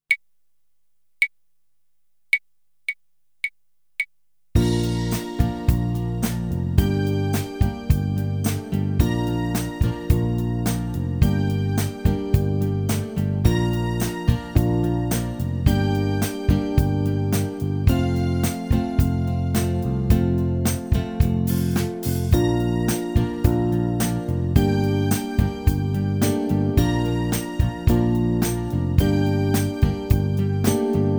Akkordschema

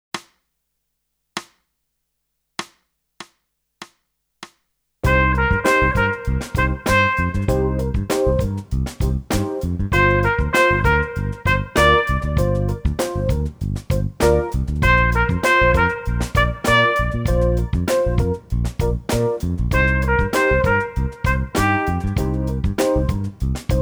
Echo Ubung

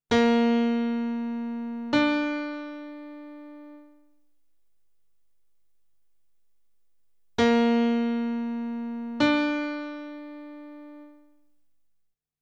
Intervalle Terz

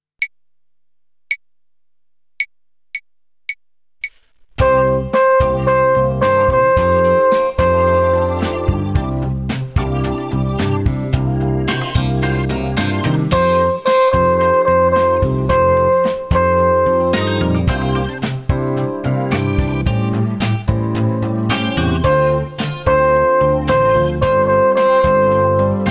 Echo Ubung

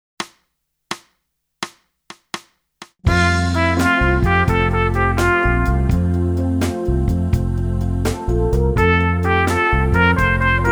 Echo Ubung

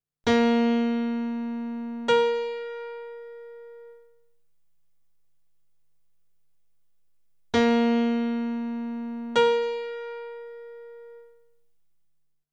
Intervalle Oktave

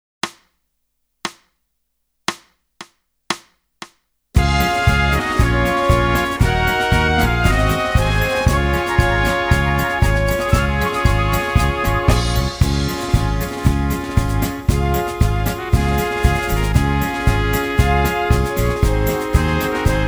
Marsch

